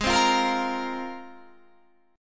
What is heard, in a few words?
G sharp 6th Add 9th G sharp Guitar Standard; strummed; capo=3